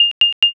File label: microwave